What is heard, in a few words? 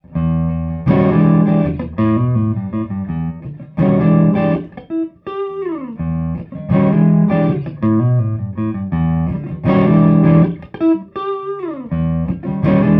Vortex Mic, amp samples